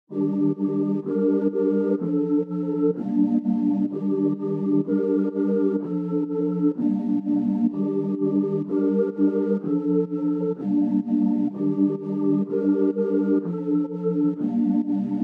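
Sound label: G minor 126 BPM Pad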